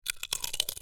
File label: Snack Bite